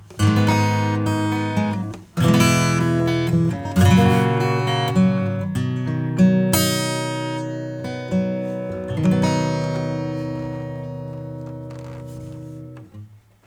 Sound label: acoustic; Passive-Active